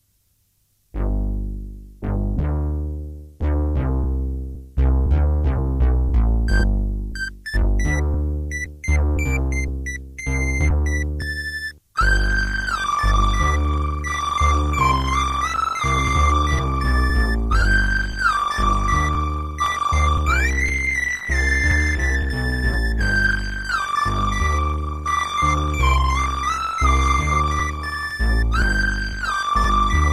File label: silly synth song